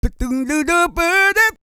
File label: SING, Vocal Planet R&B & Dance; ILIO